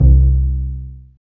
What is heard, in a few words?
STR CB JZ, Cb JazzPizz; Roland - LA Composer vol. 1